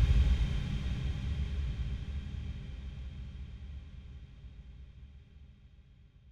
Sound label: Tails, Drum Bits, Verb Tails